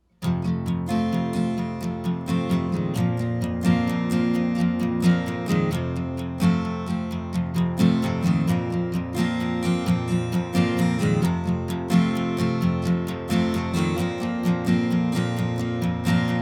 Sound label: Guitar Dry